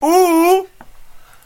oooooohh

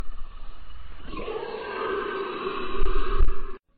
dragon sound effect

